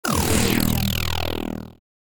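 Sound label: laser gun; jet fx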